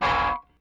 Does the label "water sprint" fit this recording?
No